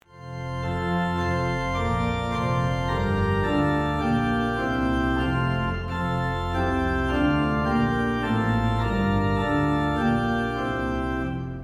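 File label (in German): Orgel, pfeifenorgeln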